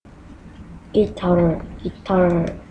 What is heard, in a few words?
guitar